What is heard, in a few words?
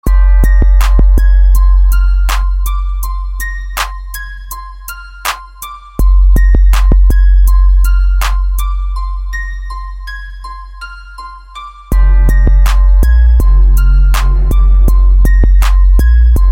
Bass Blaster